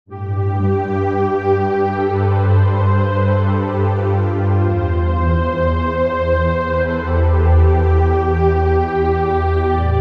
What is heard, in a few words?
Deep Blue Clouds